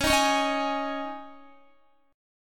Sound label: Db Suspended 2nd Guitar 4F,4A,5C,5G,5C,6E; strummed; x,16,15,18,15,16